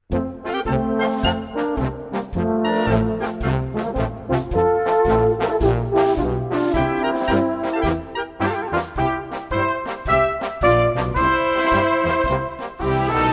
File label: Polka